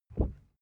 cardboard wobble short soft

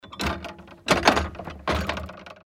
garden door close

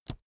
keyboard laptop space push soft

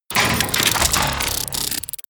robot transformation metal

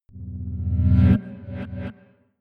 short dark chord

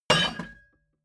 hammer hit metal

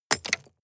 drop stones impact

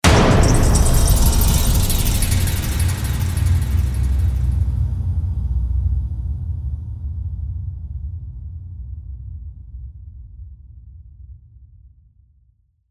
big drum cymbal rain

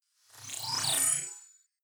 flyby splutter

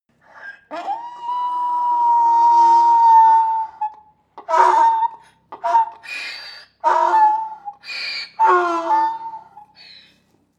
donkey bray long

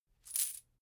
chain mail armor movement

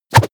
whoosh air hard fast

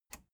plastic button latch loose release enable

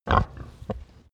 pig grunt short

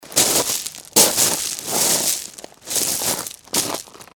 steps on stony path

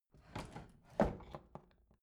bathroom drawer open rattle

